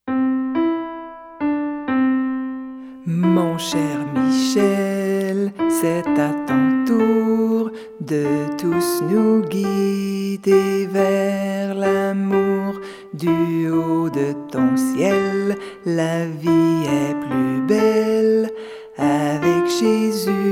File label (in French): piano paroles